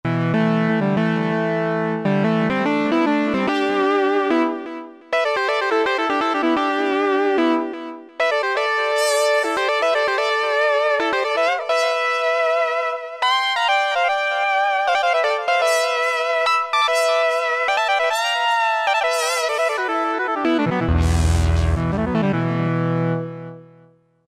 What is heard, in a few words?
MultiMG 5ths, Roland SR-JV80-04 Vintage Synth